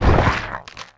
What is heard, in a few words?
damage; Fitton